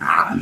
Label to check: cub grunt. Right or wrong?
Right